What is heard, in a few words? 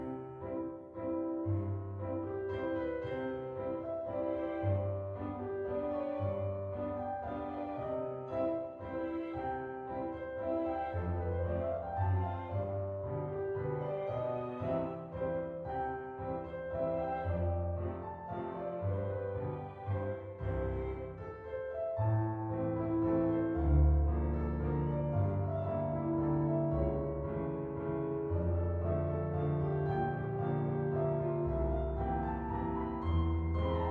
restaurant background music; Undercover Operation Wintersun; Windows gamerip 2006; cutscenes